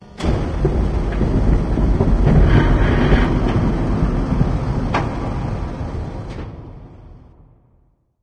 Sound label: amb muffled lo mach